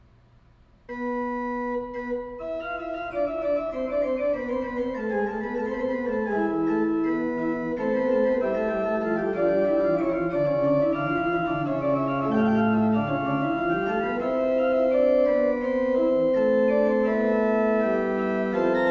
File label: Fugue, Organ; Magnificat quarti toni; Johann Pachelbel; Complete Organ Works